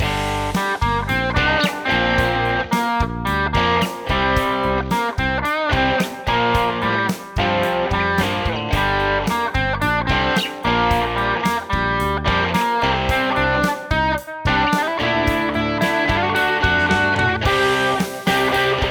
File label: Bad Jam Rock; Highway Blossoms